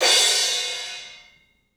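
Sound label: club cymbals; 3D Sound; 3D Drums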